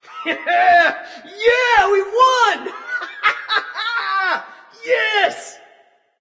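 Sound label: non verbal yay, voice; cernunnos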